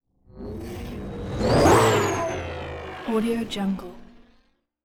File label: wave warped ships